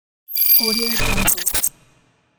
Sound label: intro glitch; quattro-boom